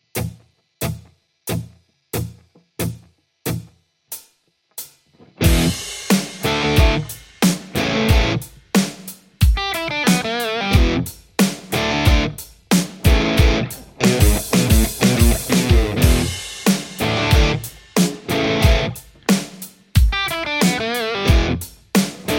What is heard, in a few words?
lespaul le456; poulin